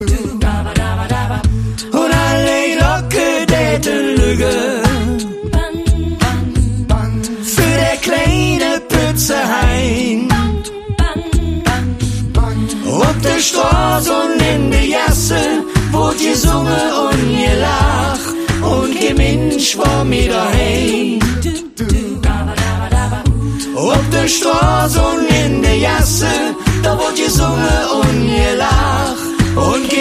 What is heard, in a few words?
a cappella